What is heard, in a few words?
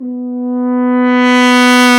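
brass, F.Horn Swell; Roland L-CD702; F.Horn FX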